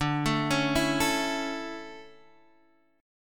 D Major 7th Suspended 2nd, chord

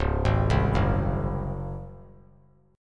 C sharp Major 7th Suspended 4th; arpeggiated; chord; 1,3,3,4; JGuitar

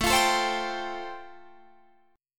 A-Minor 7th Sharp 5th, Guitar Standard, chord; 17,20,19,17,20,20; Am7#5 strummed; JGuitar

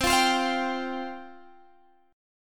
C Suspended 4th C

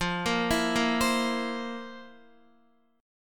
F Major 7th Suspended 4th, Guitar; FM7sus4 arpeggiated; JGuitar

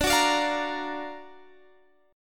F sharp Augmented Major 7th, D

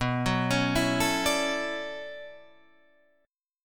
B-Minor 11th B down Guitar Open D

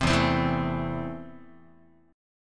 E Suspended 4th Sharp 5th, Guitar, Esus4#5 chord; strummed; JGuitar

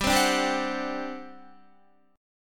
G Major 7th Suspended 4th, G, down, Guitar; GM7sus4; strummed

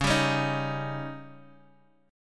C sharp Suspended 2nd Flat 5th C sharp, chord; x,x,11,12,13,10,x,x

